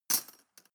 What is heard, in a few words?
cutlery teaspoon drawer place